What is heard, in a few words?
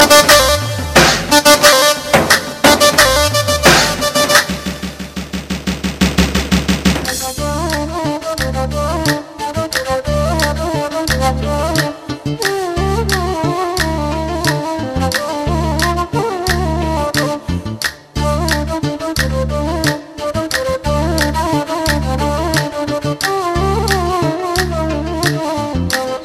Arabic Folclor